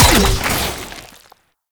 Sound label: Infested Shotgun Alt Fire Mech; Weapons